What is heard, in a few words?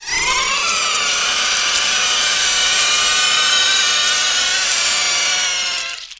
Maggot die; Diablo